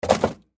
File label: Freezer Object In, Freezer Put Object In Throw Toss; Appliance; Kitchen; Blastwave; Household